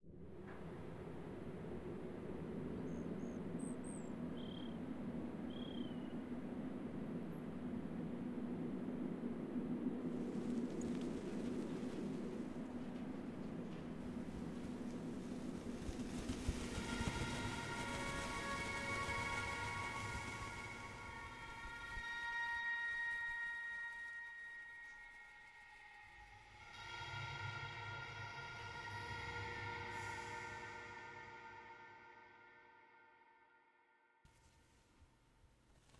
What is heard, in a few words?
Dark Forest Ambiant